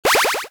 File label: start; Parking Boom